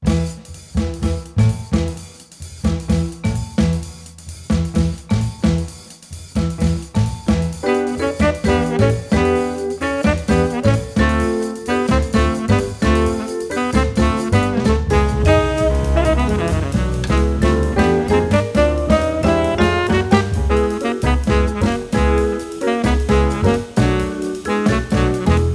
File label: 8-4 Beat; jazz